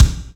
trebly kickdrum sound C key, kick drum sound tuned to the C note